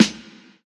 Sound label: short reverb steel snare drum sample G sharp key